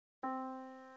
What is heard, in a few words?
yamaha Disk